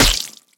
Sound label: bat hit flesh